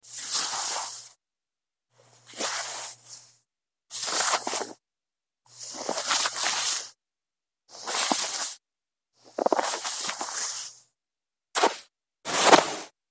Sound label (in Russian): sneg trenie i soskablivanie snega